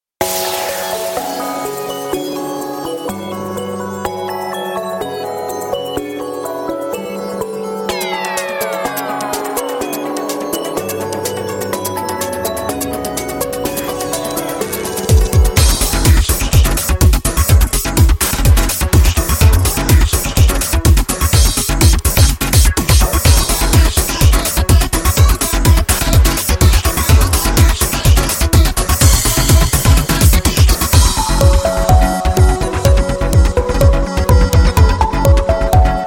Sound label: bgm